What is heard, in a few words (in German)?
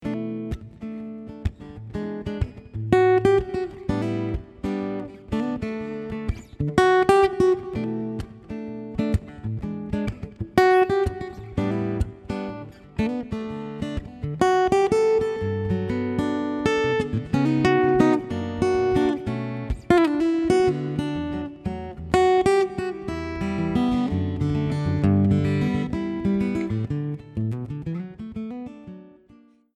Hoerprobe Gitarrist